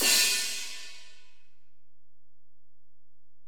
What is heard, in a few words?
Ride, Alesis DM-PRO